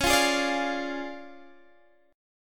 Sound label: D Minor Major 7th Flat 5th, C sharp